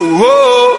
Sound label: "woah" SUNG